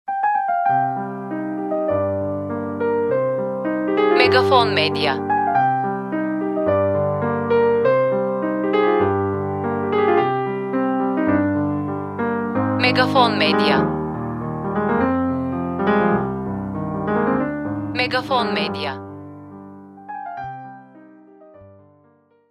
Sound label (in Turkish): SANTRAL MÜZİK